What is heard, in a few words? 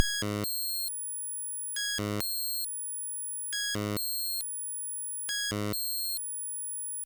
LSDJ Wonkin' Loop 136BpM, Nintendo Gameboy Loops